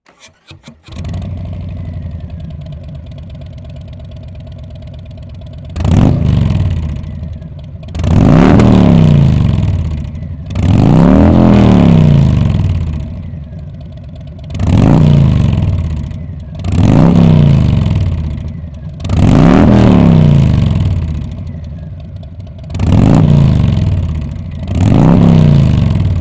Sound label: Softail 107 3.5 Slip-On Rev